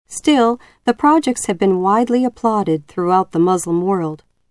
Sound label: applaud